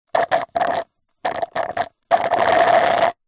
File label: food drink milkshake thick suck dregs straw slurp